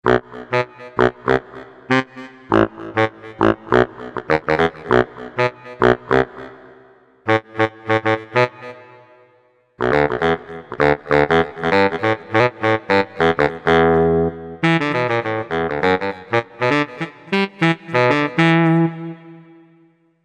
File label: Korg MSC-11S Brass; Poppn'Bari